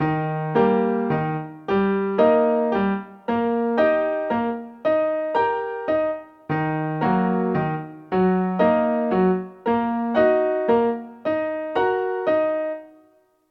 triad and inversion next rhythm in E flat and E flat m